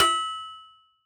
Bell Cash; One Shots; Drums; Ableton Live 12 Lite; Core Library

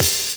Hihat Open Vinyl Kick, drums

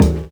Conga Vinyl; One Shots; Drums; Ableton Live 12 Lite; Core Library

